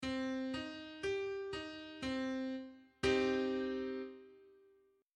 C minor; piano

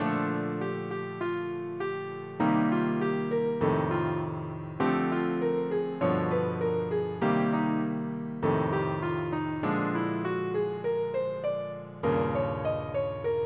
rhythm changes solo

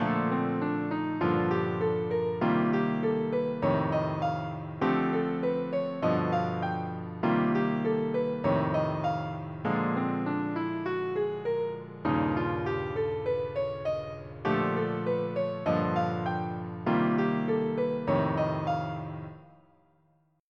rhythm changes whole tone

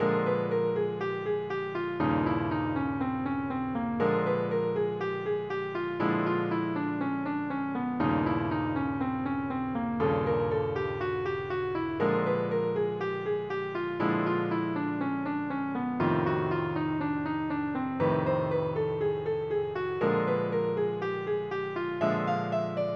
blues changes diminished

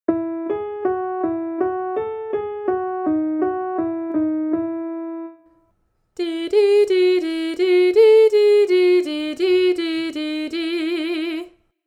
Vocal Exercise

